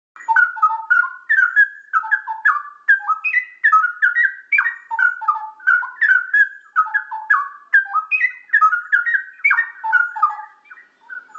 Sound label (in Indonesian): Suara Cucak Rowo Gacor; Juara Durasi Panjang